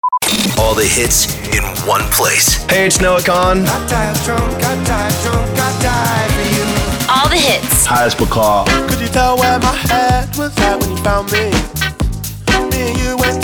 sweeper beatmix

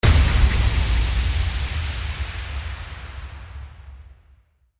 IMPACT

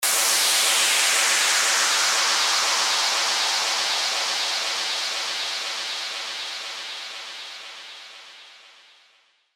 WHOOSH

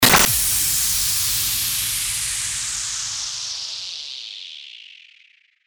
STARTER WHOOSH

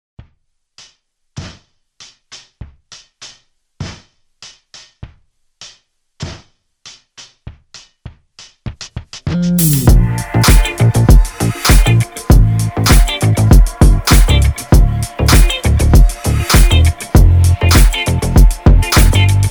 INST 99BPM Dm

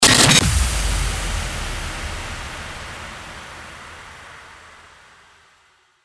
FX STARTER IMPACT

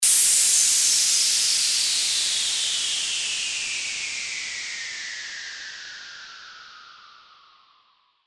WHOOSH

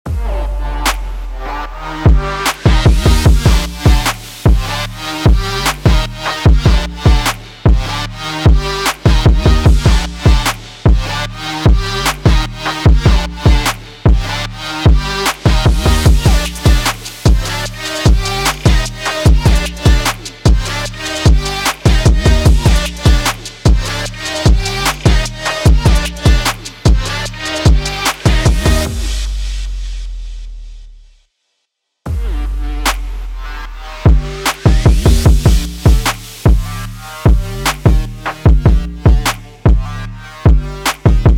BED TRAP G#MIN 150BPM

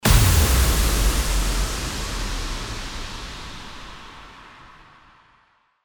IMPACT

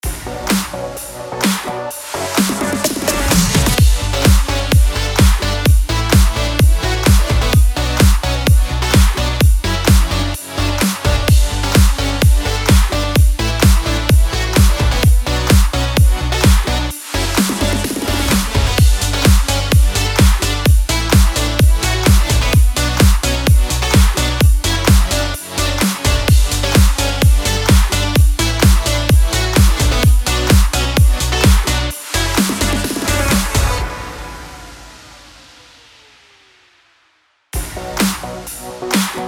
ELECTRO GMIN 128BPM

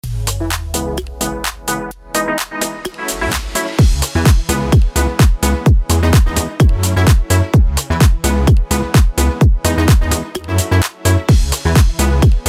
BED HOUSE CMIN 128BPM

